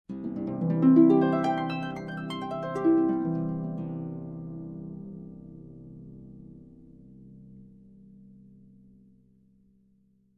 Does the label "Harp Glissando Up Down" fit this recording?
Yes